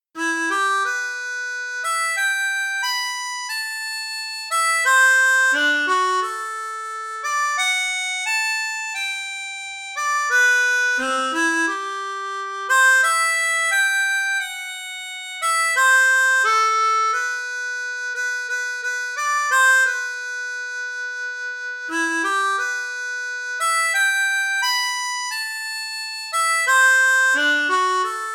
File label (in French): Melodie Baroque, harmonica